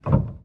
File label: piano release